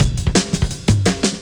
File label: Beat Style, 170bpm, breaks